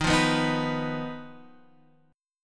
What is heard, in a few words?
D sharp Suspended 2nd Sharp 5th D sharp